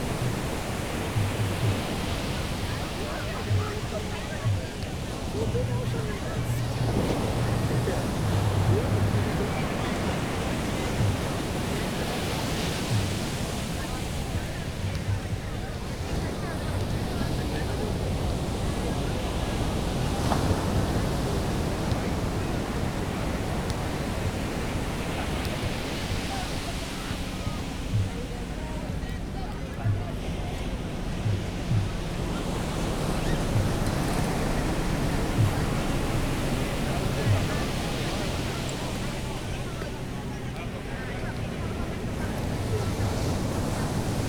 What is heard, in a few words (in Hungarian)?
copacabana messzebbrol az ocean limonadearus, rio